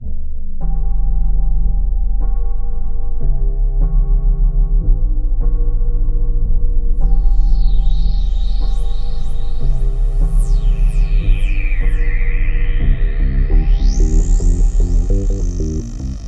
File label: Funk; Bambi; tracker music